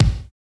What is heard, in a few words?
BIZ KICK; Drums In Your FACE!